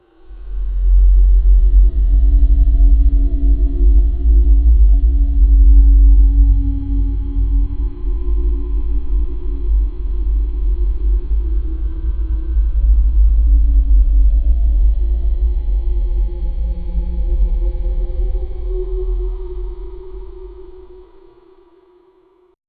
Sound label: ambient sound of a shadow